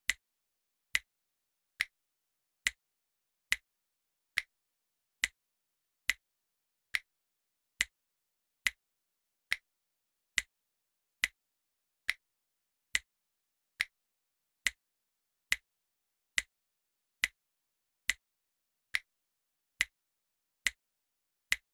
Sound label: Snap